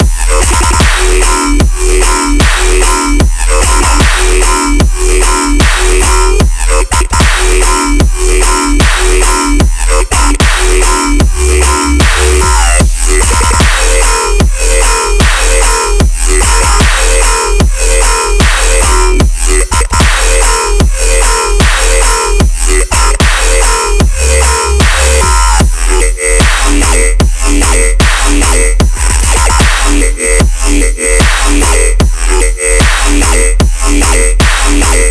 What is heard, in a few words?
Wubstep, phonetones ringtones; KDE; Plasma-Mobile-0.1